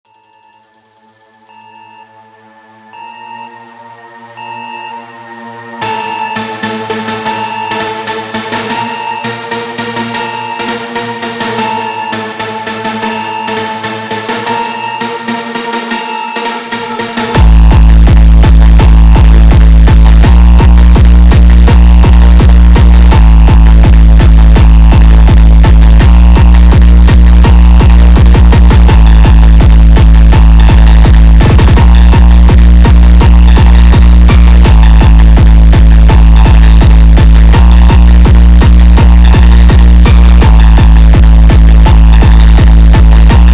Sped Up bassboost

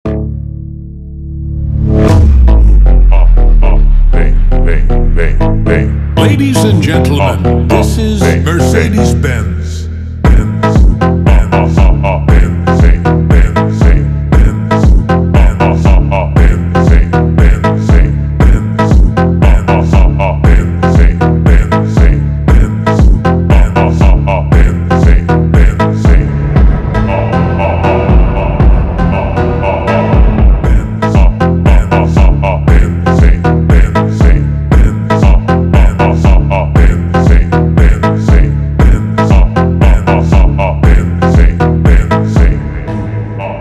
Funk slowed Reverb

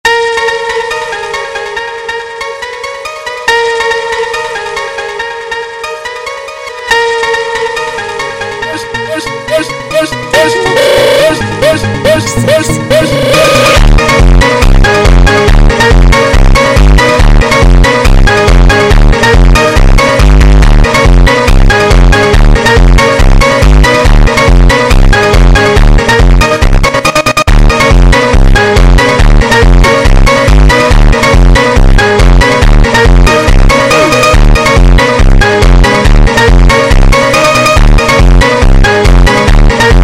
Funk sped Up

